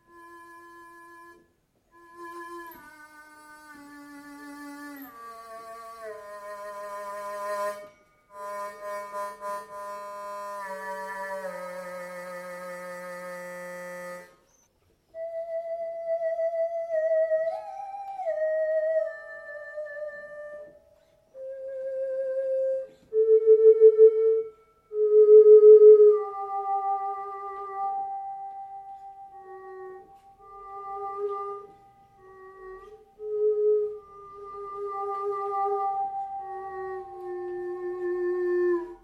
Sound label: Metallic